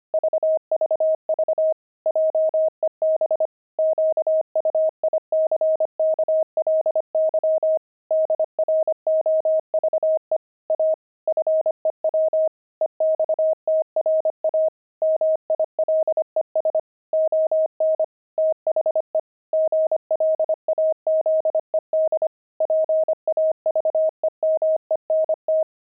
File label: pangram, intermediate pangrams files